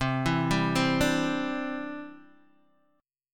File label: C Major Add 9th, C, down, Guitar, Open D